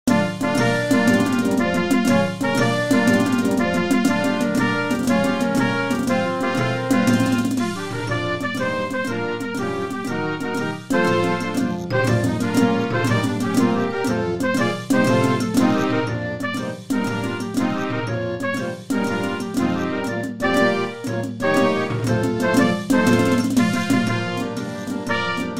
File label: Jazzy March harmonie; midi